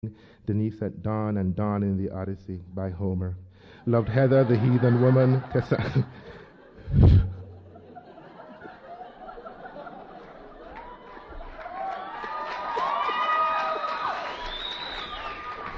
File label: audience